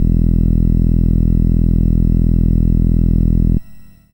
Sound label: SID Bass E, chip; CHIPSHOP HITS